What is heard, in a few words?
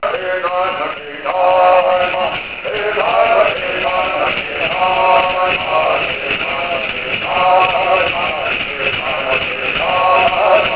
Bird